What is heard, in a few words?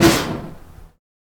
Fire Staff, Fire sound effects; Fire Staff 8